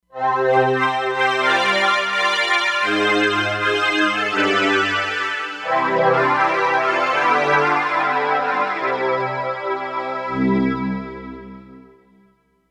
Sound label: Ersatz Ahs; Factory patches